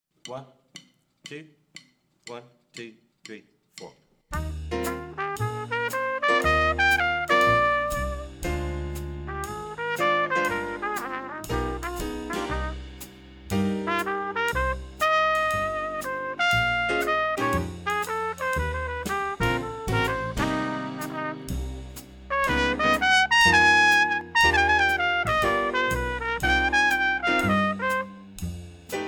swing etude lead trumpet

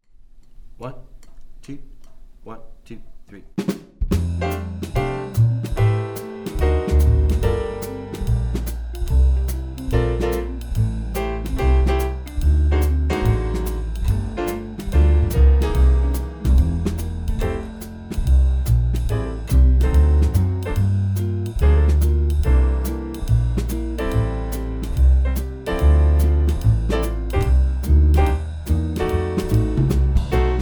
swing rhythm section

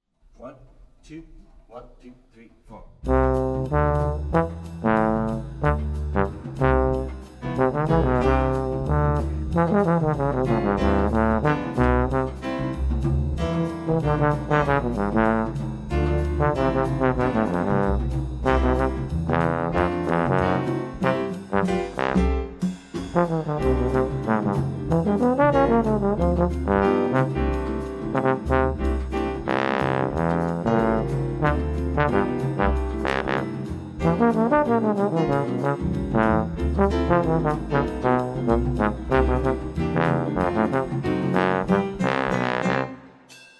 latin etude bass trombone

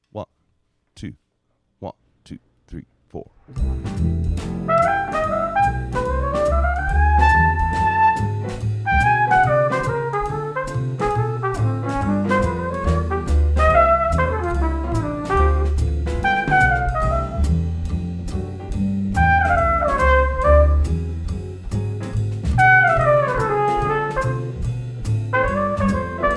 lead tpt

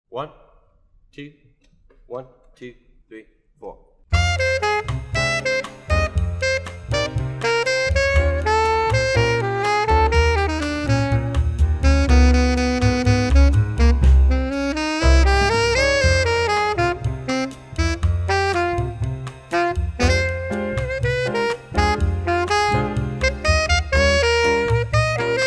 latin alto saxophone

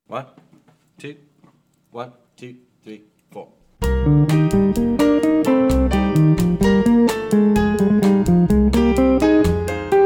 latin etude guitar and vibes with solo

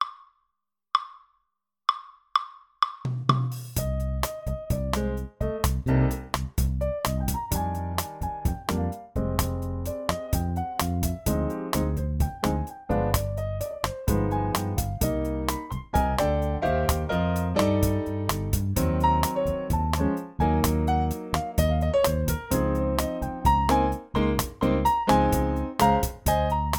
latin etude piano with solo

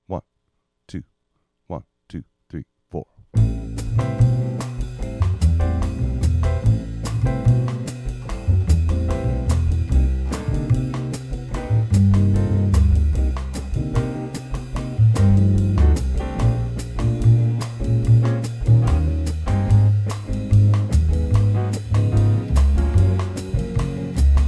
latin full rhythm section

